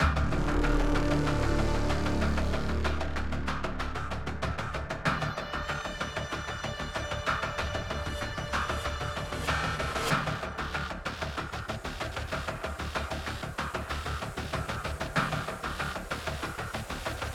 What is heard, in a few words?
Mood Tension Medium